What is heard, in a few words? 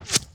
coil; quake